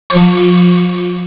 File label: D50 sound; ST-87